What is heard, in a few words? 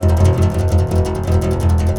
C. Bass Balalaika, Plucked Strings